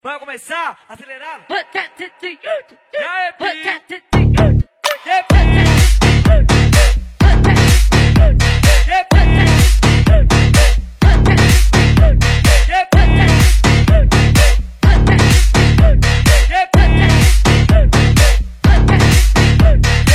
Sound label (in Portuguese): Paredao Treme Treme Mega Funk